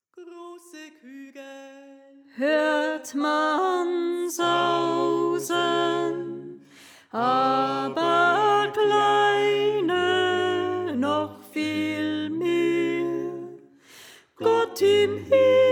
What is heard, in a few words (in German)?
Sopran